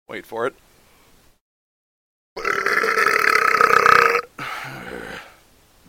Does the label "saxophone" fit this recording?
No